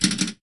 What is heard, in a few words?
Pinball Bumper